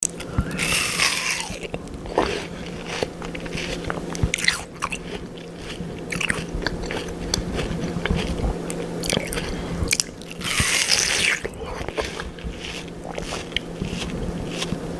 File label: watermelon asmr